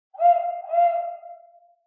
Night Time Animal Call; SoundsCrate

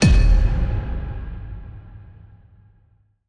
Epic Metal Hit; soundscrate

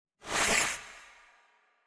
water sword; soundscrate

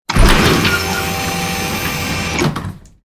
Robotic Movement; SoundsCrate

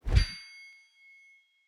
Sword Swing; soundscrate

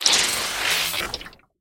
impact glitch; soundscrate

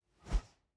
Fighting Woosh; SoundsCrate

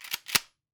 Shotgun Racking; SoundsCrate

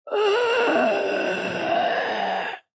Scary Voice; soundscrate

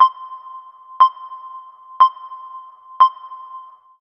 clock; soundscrate

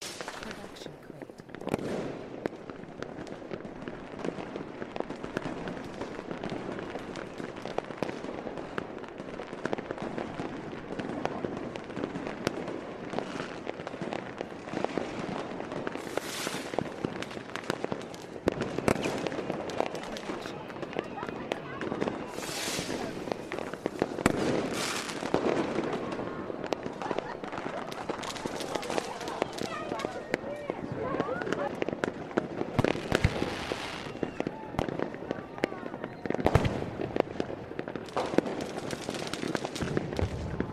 fireworks; soundscrate

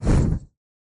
Fire Woosh; soundscrate

